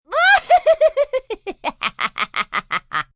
Laugh Cackle, Laugh, female, witch cackle (human voice); Laughter; Voice Box